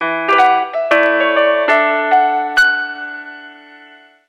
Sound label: Message Alarm, notifications; SK telesys SK-S150